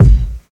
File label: puffy steel kick drum a key, Royality free bass drum tuned to the A note; kick drum samples